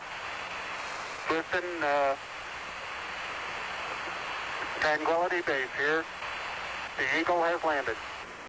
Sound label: landed